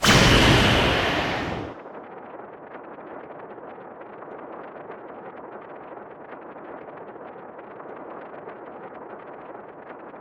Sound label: heal effect